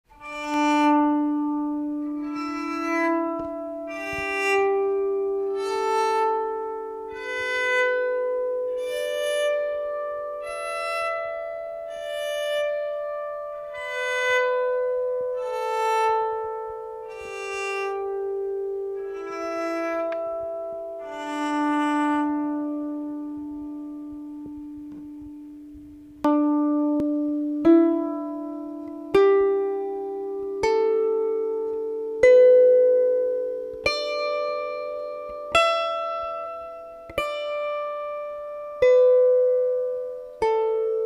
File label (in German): leier